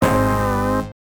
Bonus Game - Lose; Animaniacs; Genesis

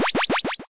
SFX - Loot; browserquest; android

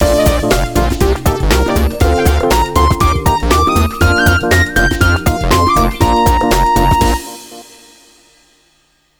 Succeed; EyeToy Play Sports; PlayStation 2

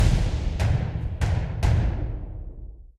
Hunt Ambience; Assassin's Creed III

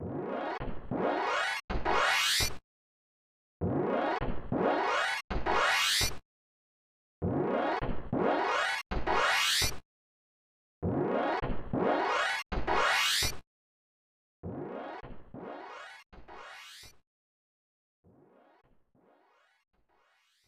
Skateboard Flips, snes; Radical Rex

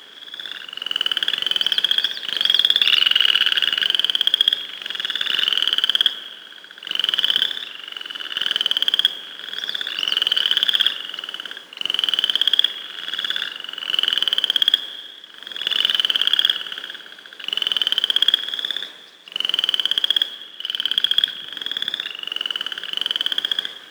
environment frogs

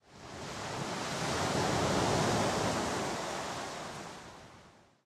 Amb Beach Waves

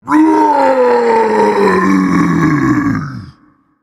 Vocal Colossus Scream

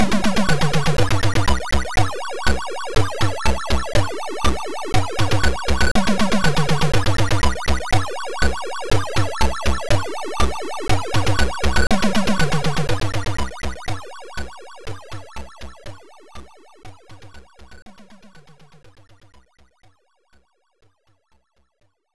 Level Completed; X-Out; Atari ST

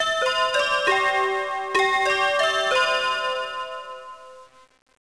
Chime; quiz gakumon no susume arcade gamerip 1993

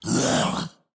Magic Attack Vo; Magic Shrek

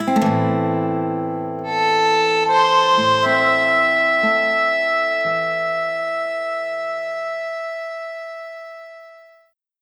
Game Over (Western); paper mario the origami king

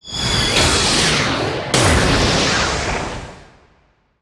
transform

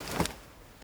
ui inventory open

